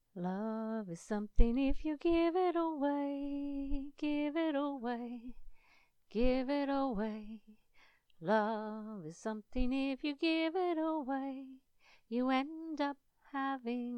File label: vocal